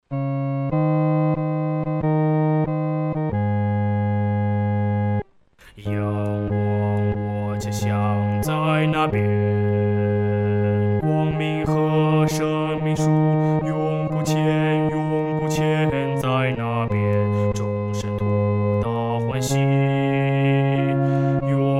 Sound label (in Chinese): 独唱 第四声